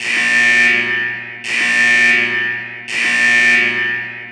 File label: buzzer; massdriver; Tanks